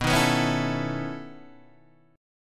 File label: B 11th B Guitar DADGAD, chord